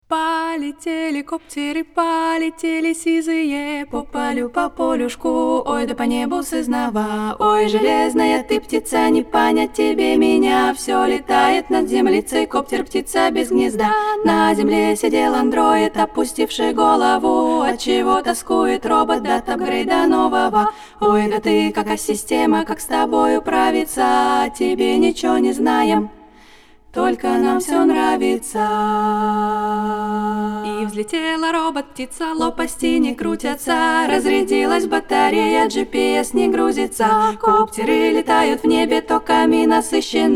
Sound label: russian cyberfolk song